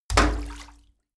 stone hit bathtub water; natur-tiere; steine

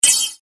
airy phaze, Swooshes and swiches; swooshes-swishes

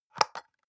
soap dispenser press empty; haus-garten; badezimmer

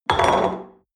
espresso upset table medium short

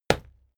fight impact sweetener bright; krieg-waffen; nahkampf; soundpool

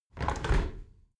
window handle squeak turn close halfway regular; objekte; fenster

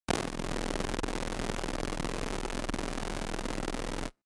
distorted noise, sciene fiction

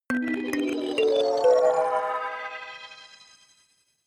notification kalimba magical sequence ascend

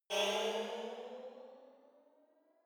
sci-fi vehicle turbo activate distant; raumschiff

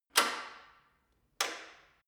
gym bicycle saddle unlock adjust; sport freizeit; fitnessstudio

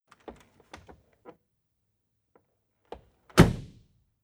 mercedes door open close

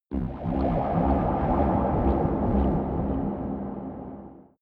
space alien scavenger ship fly; raumschiff

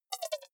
click erratic wide buzz, signale

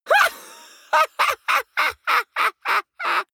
laughter lunatic; vocals; comedy-cartoon; soundpool

